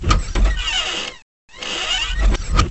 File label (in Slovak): dvere